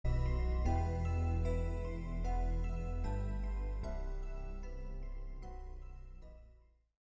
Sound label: trap type beat; toronto trap